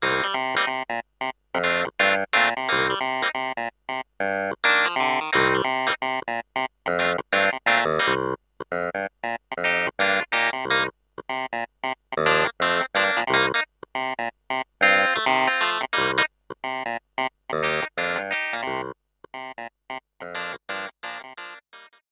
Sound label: Clavinet